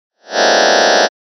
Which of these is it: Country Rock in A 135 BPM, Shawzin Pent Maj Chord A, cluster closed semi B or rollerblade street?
cluster closed semi B